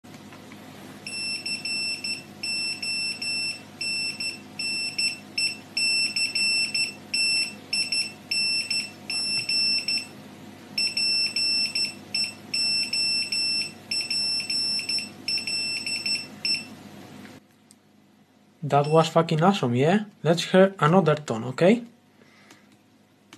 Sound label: nokia's ascending ringtone was morse code for their slogan connecting people